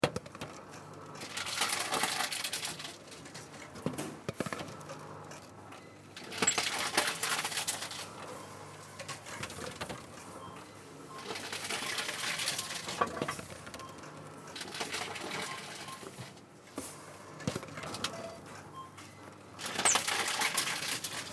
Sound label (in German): Supermarkt Automat